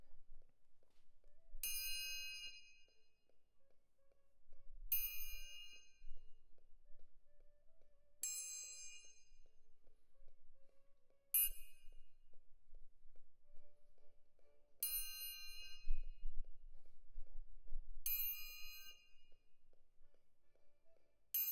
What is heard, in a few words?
Perc Close